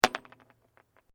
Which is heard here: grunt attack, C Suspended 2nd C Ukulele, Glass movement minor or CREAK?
Glass movement minor